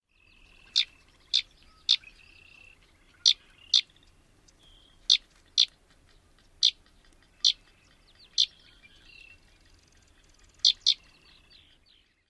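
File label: calls, Sedge Wren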